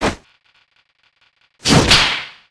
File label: hat throw